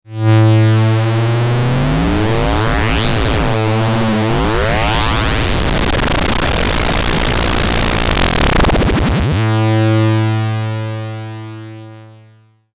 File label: X-Mod